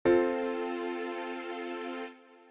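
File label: F Major Chord 2nd Inversion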